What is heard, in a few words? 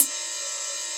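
ride cymbal; Big Fish Audio; Synth City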